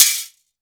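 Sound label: Metal Crash; Roland SPD-S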